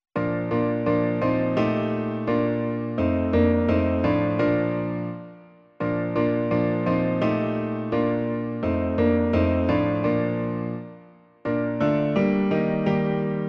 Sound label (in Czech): klavír; Kdo za pravdu hoří; Klavírní verze